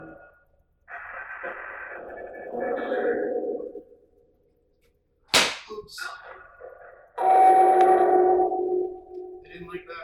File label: Hallway Phasma Box